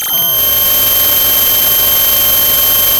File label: pop, Sound of a cat meowing